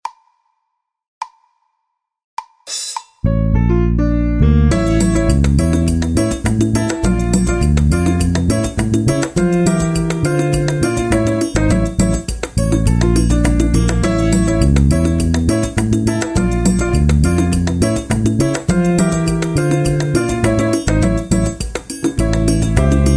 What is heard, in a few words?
Salsa